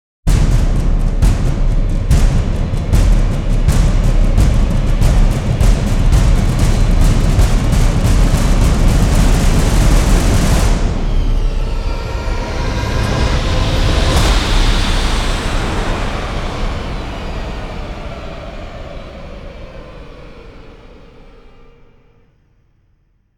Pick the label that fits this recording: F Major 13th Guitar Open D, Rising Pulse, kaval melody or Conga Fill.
Rising Pulse